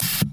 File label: Hydraulic Release, pneumatic